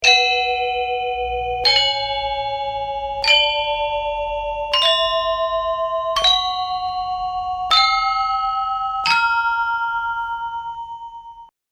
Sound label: scale